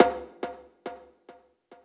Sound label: percussion one shot; Slate; SD PASADENA Pop Sample Pack